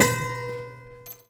Prepared Piano; Battery 3 Sample Kits

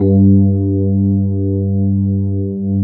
Fender G1; USB Soundscan vol.09; AKAI; Keyboards Old School

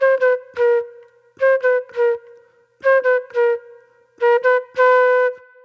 Wild Flute

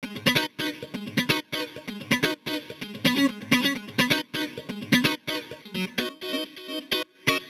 128 G# FX; RidgeCity; MELO + FILTER; RoBKTA Sample Pack Supreme

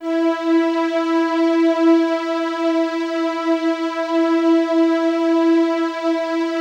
LUXURY E5, LUXURYPAD; Drums In Your FACE!

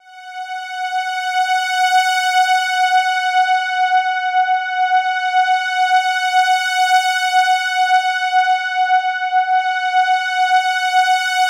BEYOND F#4, STRINGS; Music Store Sound Service; Einstein

